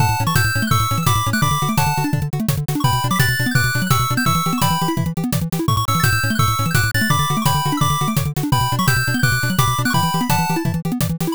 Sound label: bgm drive